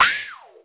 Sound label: SFX HYPERS; E-MU Formula 4000 Series Vol. 2; Dance Hits